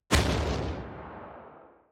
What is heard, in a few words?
rocket explosion; Tailgunner